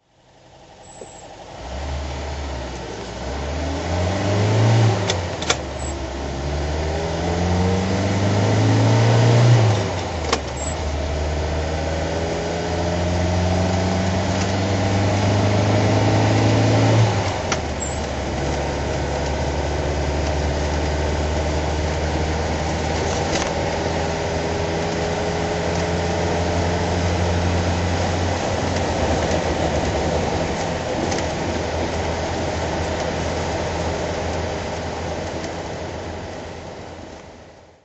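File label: mb 180 drive, Engine sound driving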